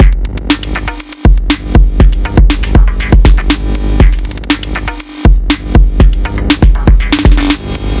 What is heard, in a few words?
808 Beat, Drum Loop; 808 Kelptor Beat; Sonic Foundry (Sony Creative Software); The Electronic Music Manuscript Collection; Drum Loop Structures